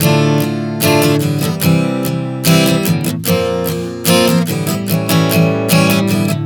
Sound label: Progression 074 Dm-C-Bb-A7, Acoustic Guitar, Chord Progressions 074 bpm; Sonic Foundry; Songwriter Acoustic Guitar Companion